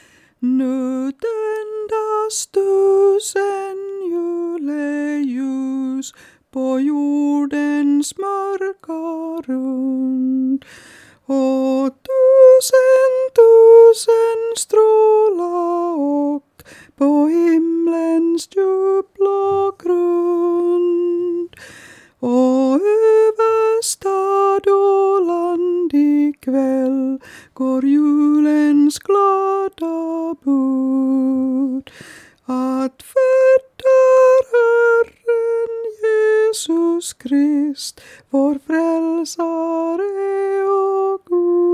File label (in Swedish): sopran